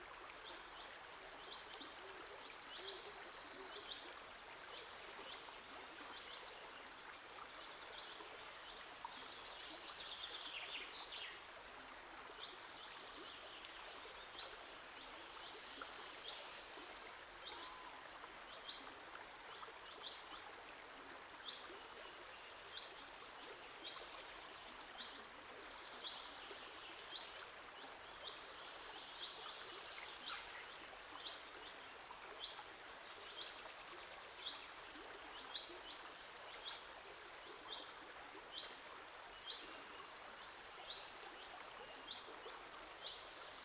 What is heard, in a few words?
main Ambience Birds; strollingstaxx